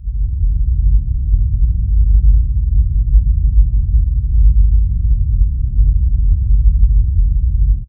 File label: SFX, synth atmos & fx; Dream Zone